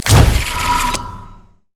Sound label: gate start; LoL; twistedfate